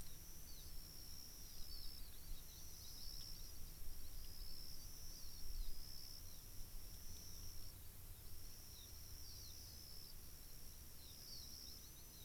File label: Diurnal shrubland New Caledonia